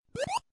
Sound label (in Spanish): saltito mario